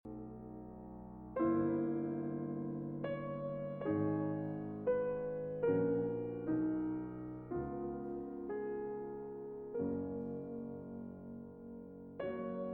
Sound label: Piano Sonata in B Minor Andante sostenuto